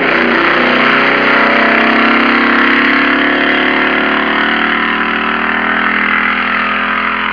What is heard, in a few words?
car with glasspak longer; The sound of the acceleration of a flathead V8